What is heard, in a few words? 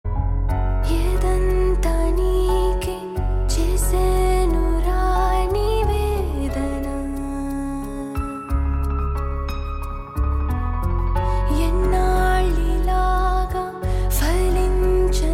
Ringtone